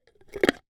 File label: household jar lid put on; zapsplat